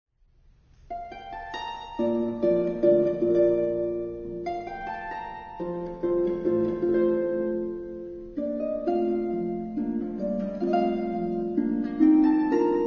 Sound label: Harp